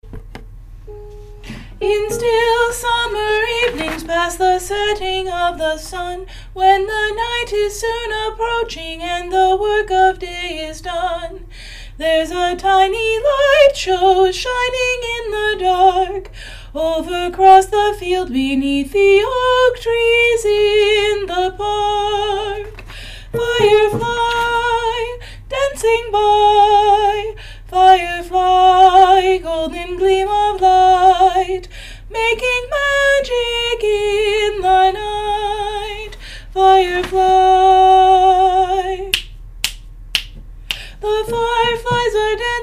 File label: duet soprano